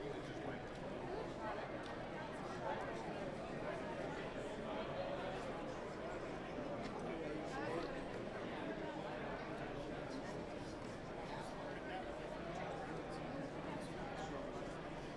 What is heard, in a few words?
convention noise